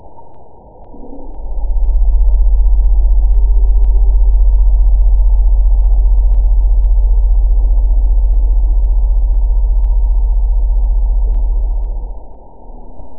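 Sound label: blue whale; acoustics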